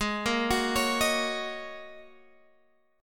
G sharp 7th Suspended 2nd Suspended 4th, Guitar, Open F; arpeggiated; 20,17,18,20,18,x; G#7sus2sus4